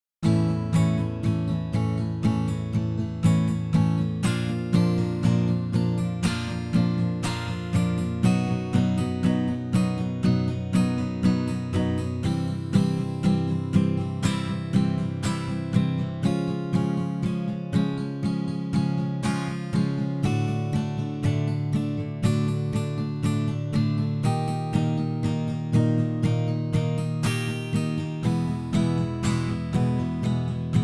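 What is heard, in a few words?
Guitar, Strum, Even 8 4-4 120bpm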